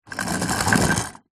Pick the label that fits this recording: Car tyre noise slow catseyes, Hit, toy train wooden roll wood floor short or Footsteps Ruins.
toy train wooden roll wood floor short